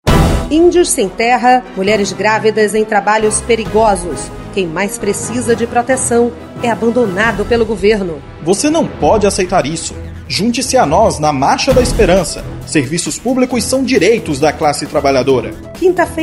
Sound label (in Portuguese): Spot Marcha